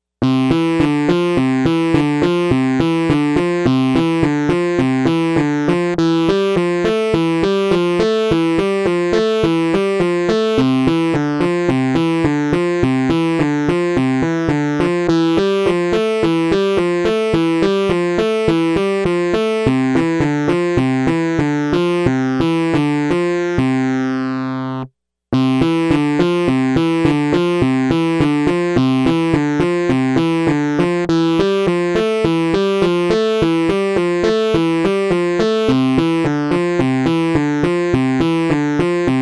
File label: analogize Original TX81Z